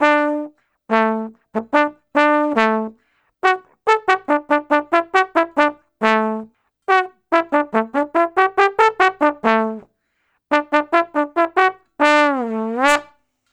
Trombone Solo, Jazz

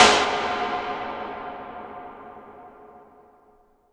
IMP SNR DRUM, Drum, Cinematic Impact

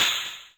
hi hat closed, Korg Electribe ER-1

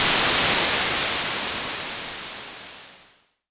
FX, Moog Minimoog

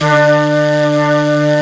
Flute, Singin' Flute

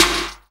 SD 808; Best Service Gigapack 2

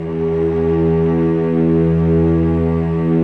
STR, Vcs mf Amb

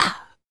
FEM PERC, Vocal Jazz FX

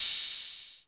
D70 HH, D70 DRUMS; Crypton - DrumBase5000

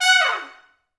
Pop Brass, F#4; Best Service; ProSamples vol.19; AKAI

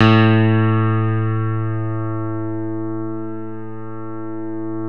CP-70 SFT A1, CP-70 El.Grand; E-MU Producer Series Vol. 2 – More Studio Essentials

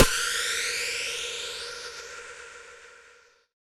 PAIN HIT; Spectrasonic Distorted Reality 2; LO-TEK 2

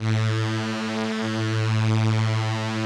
VP-330, String Machines

